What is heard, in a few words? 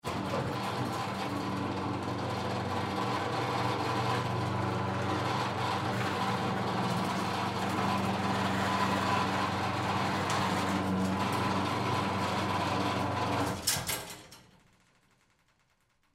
Garage Door Rusty, Garage Door Rusty Automatic Close; Garage Doors; Household; Blastwave